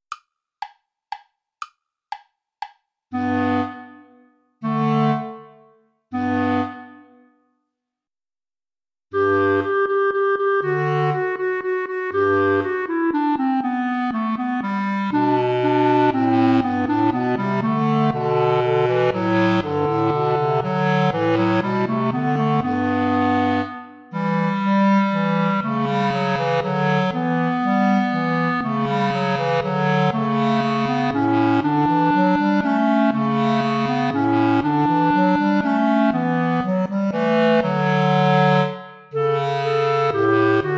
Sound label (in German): alter ungarischer tanz play along without clarinet